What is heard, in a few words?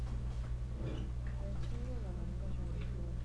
noise